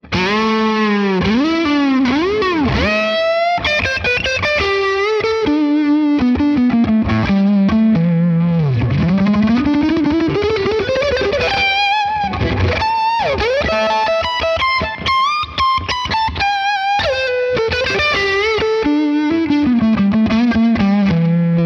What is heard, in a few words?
Morgan Amps US Lead Tone PR12